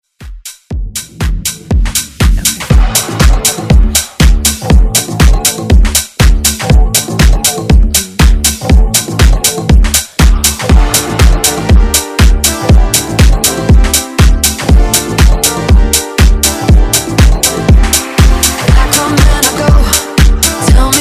House Redrum

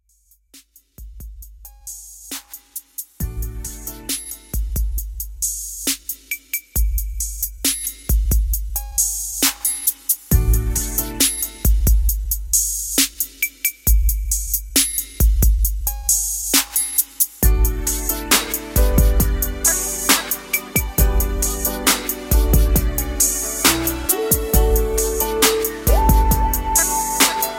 90s RB Redrum 135Bpm

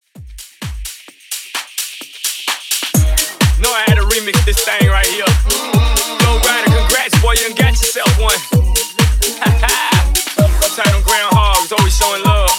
Funky Dance House, 129 BPM

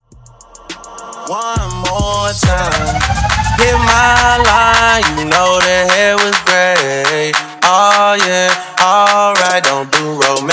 Blend 104 BPM

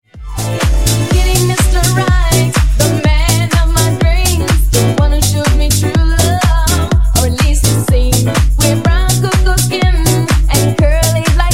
Disko Funk

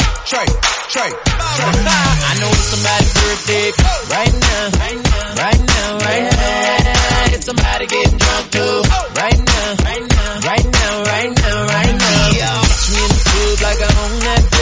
95bpm Abm

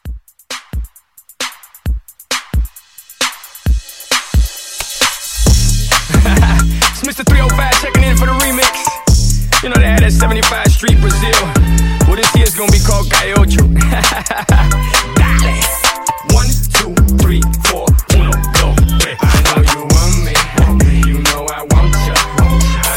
Quick Mashup Re Drum

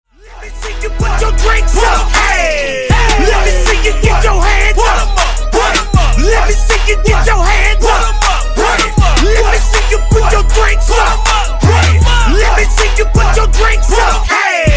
79Bpm; Dj Intro Outro Edit

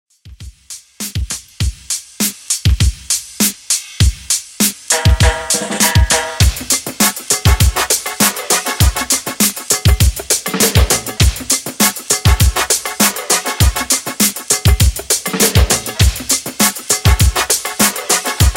Classic Scratch Redrum 100Bpm

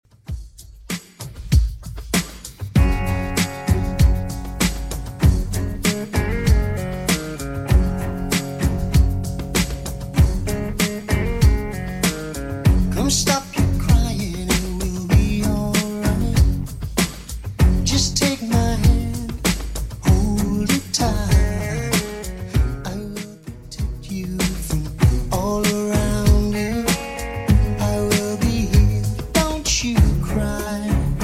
90s 97bpm; Redrum

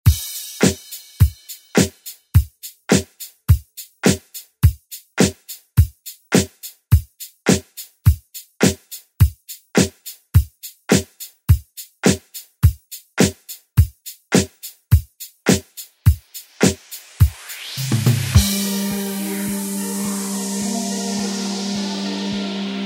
ReDrum; DJ Mhark

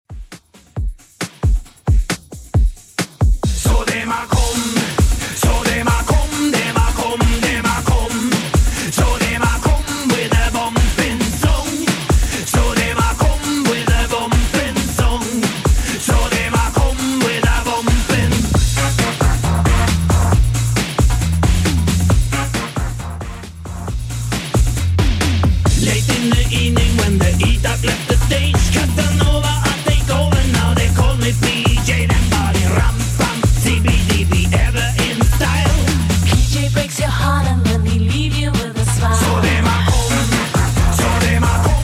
90s Redrum 135bpm

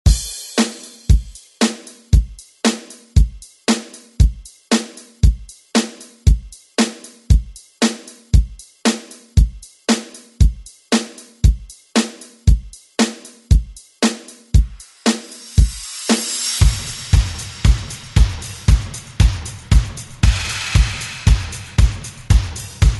80s ReDrum; DJ Mhark

